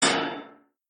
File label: shield destroyed sound; Arc of Templar